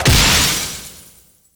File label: Enemy Gear Common Delete